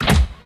Door; Mesukemo-Hime-no-Kaisuiyoku

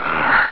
zombie, enemy; Undercroft

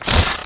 hit cold; Undercroft

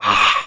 zombie, enemy; Undercroft

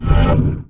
summon; Undercroft

